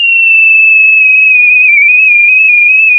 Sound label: pop, sound of a tea kettle whistling